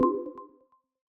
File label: explosion scan